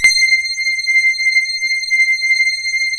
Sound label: TX C6, FAT PADS; Sound Gallery - Charged